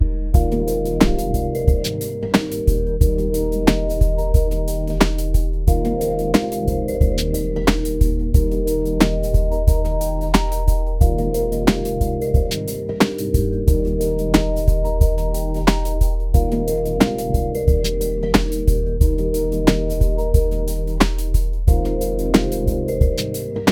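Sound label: funky chill groove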